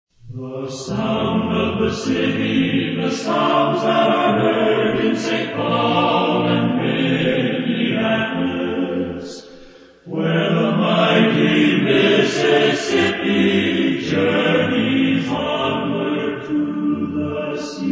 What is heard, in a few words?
Vocal; KSTP AM